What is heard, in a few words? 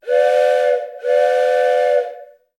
MusicFx